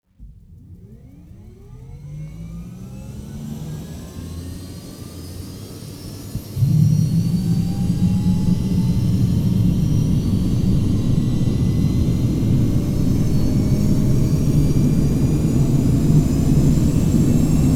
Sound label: ec145 startup interior